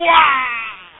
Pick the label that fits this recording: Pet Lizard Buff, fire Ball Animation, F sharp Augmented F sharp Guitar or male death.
male death